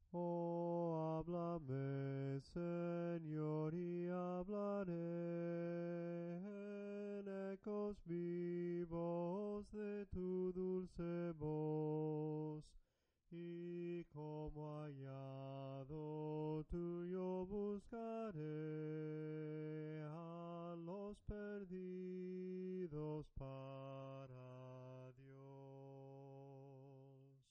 ¡OH HABLAME SENOR SOPRANO CANTADO; cantos del camino

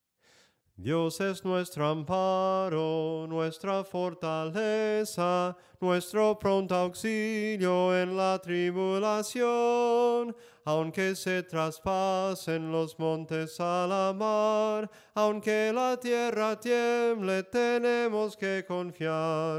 SOPRANO CANTADO; cantos del camino

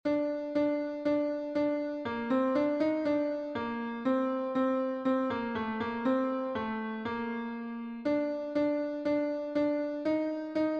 contralto, himnos en piano; 90 ALABANZAS DAD A CRISTO; cantos del camino

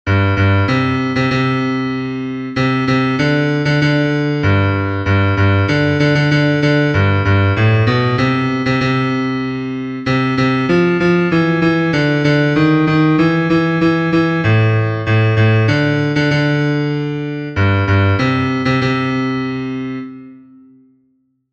BAJO, himnos en piano; 6 PADRE AMADO; cantos del camino

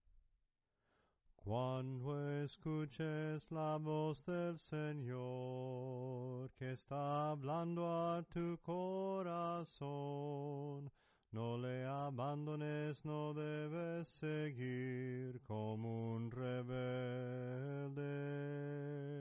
SOPRANO CANTADO, himnos en melodia soprano

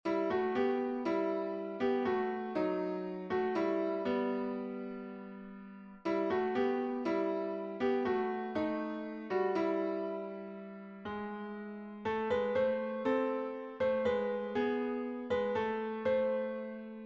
SOPRANO, himnos en piano; cantos del camino

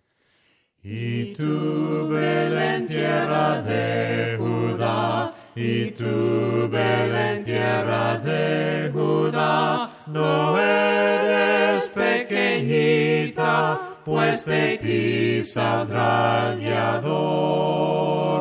VOCES, himnos a capella; cantos del camino; Y TU BELEN

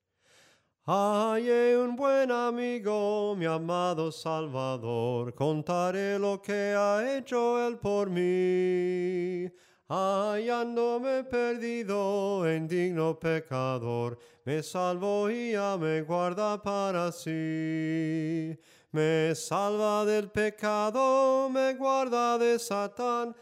HALLE UN BUEN AMIGO SOPRANO CANTADO, himnos en melodía soprano; cantos del camino

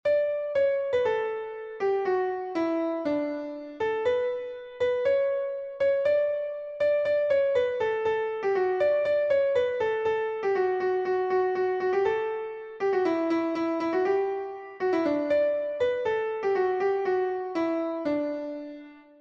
soprano, himnos en piano; 93 AL MUNDO GOZO PROCLAMAD; cantos del camino

